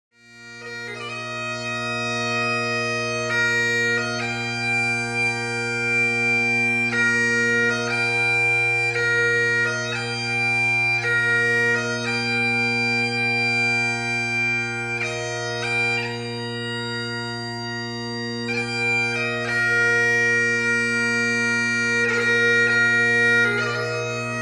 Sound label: taps